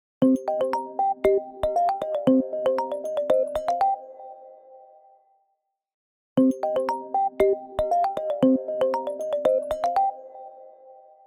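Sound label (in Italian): Pop, suonerie per iPhone; iOS 26; Apple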